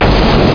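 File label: helicopter crash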